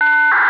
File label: EOT burst, rail